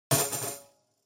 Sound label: Tambourine Drop